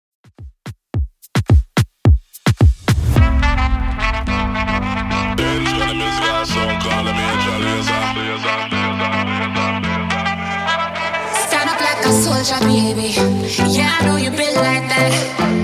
2ks Redrum 108bpm; DJ Kram

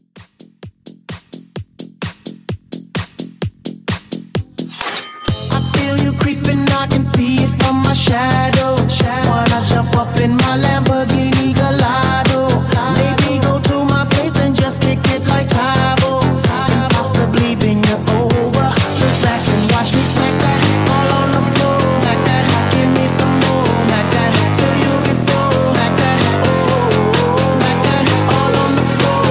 Club, 129

